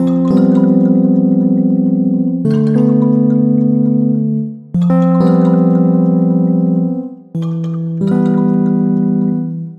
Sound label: F, Organic